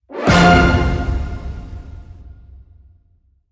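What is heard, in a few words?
Hit Orchestral Glam F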